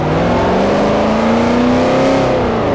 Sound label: second, vehicles; gmod; tdmcars; van